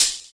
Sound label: Open Hhat, Hihat; Equipped Music; Loungin House